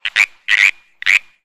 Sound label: Frog California Tree